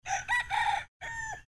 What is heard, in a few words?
Rooster Crow